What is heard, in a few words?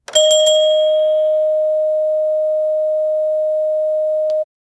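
traditional mechanical door, round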